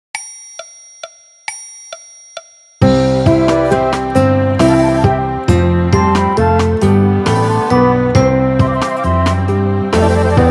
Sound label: Donavazi Piano Orch